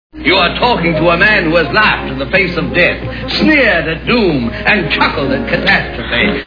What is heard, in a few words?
laughed at death; wizard of oz